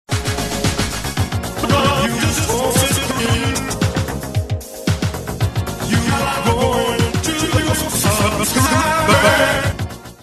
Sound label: cringe; cave